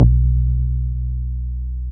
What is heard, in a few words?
MicroWave Bass